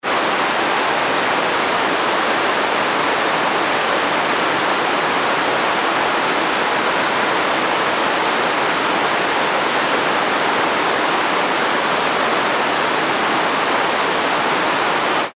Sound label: stream very strong